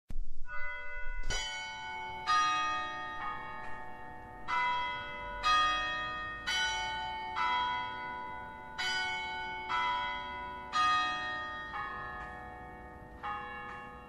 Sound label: Angelus Bells and peal